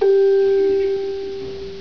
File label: ding